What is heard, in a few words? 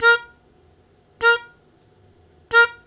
Short Attack Bb1, Harmonica; Zero G - Harmonica